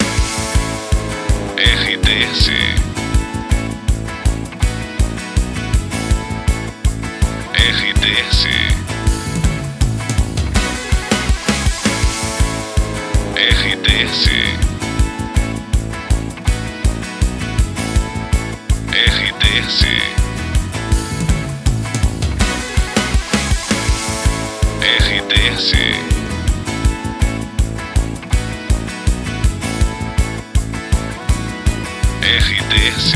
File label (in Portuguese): TRILHA LOCUCAO; Expresso